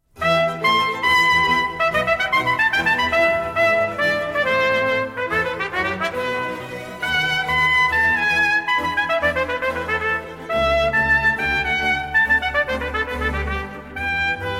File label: Trumpet Concerto in E Minor III. Gigue; Baroque Trumpet Music